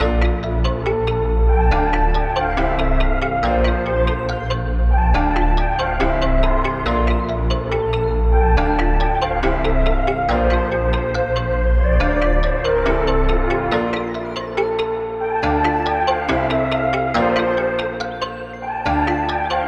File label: 140 BPM D Min, Melody; Candy; Cymatics; Infinity Melody Collection